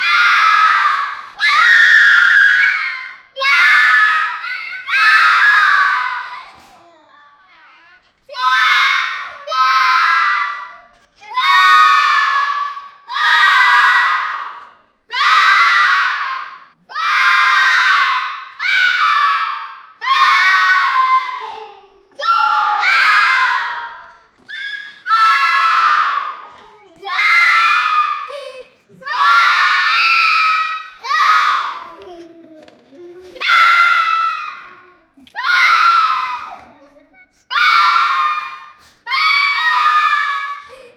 children screaming loud

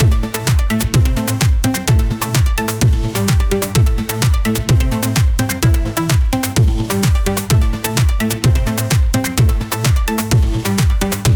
music latin dance

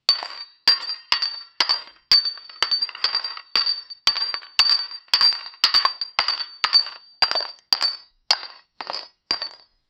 knight sprinting loud pla, armor

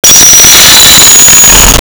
a minecraft themed stone, break

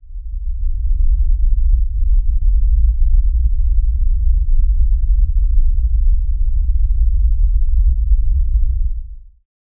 portal

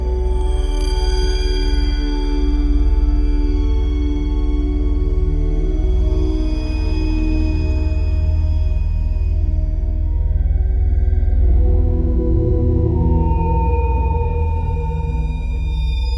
haunted medieval hollow, low strings

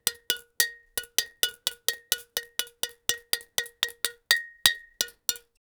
small tapping on a metal, can

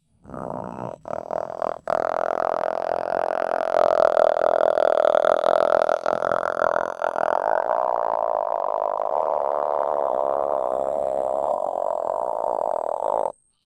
ostrich running, galloping; 1000 of ostrich running

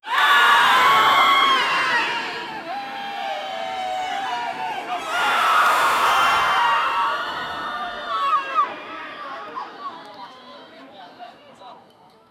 the sounds of explosions; human reactions

